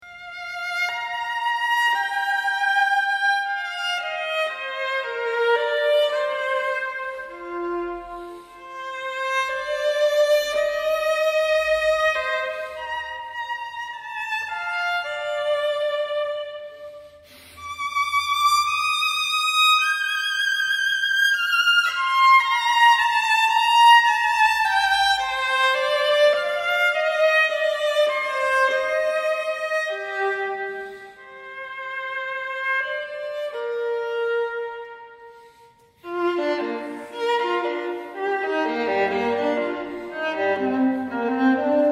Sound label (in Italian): VIOLINO